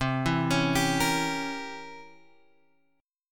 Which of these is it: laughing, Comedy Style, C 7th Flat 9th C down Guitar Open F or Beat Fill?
C 7th Flat 9th C down Guitar Open F